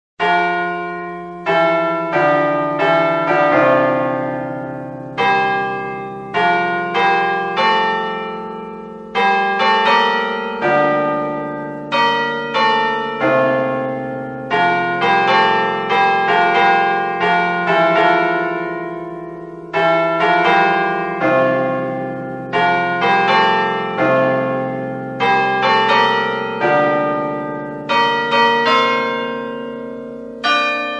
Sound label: Americian Bells